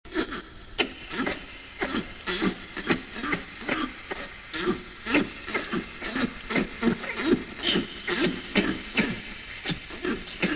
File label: noise